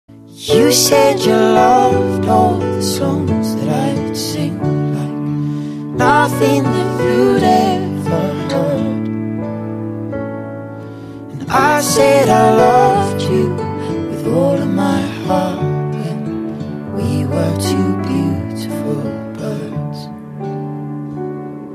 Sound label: Beautiful Birds Passenger